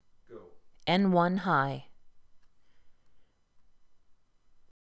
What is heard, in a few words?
N1 High